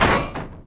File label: door open metal